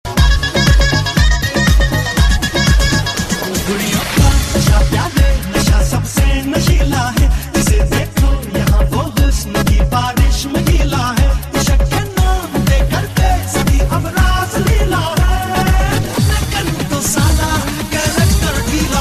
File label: remix ringtone; ready 2011; bollywood ringtones